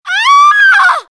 Scream; Krystal

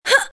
Jump; Krystal

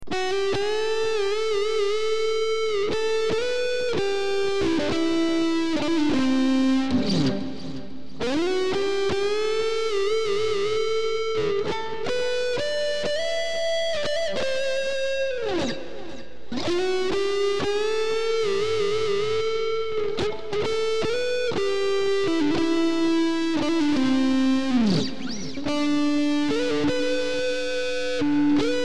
Guitar; Fan Music

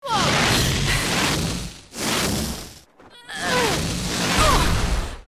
Ooof

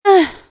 Ugh; Krystal

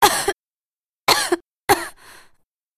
Cough

